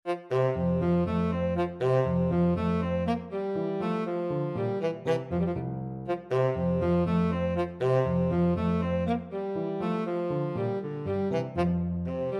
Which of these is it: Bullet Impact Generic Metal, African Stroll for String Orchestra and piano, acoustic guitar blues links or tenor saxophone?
tenor saxophone